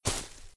landing; jumping

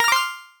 success; throwing

